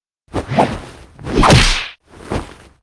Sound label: attack; dgs-crogrey; tobias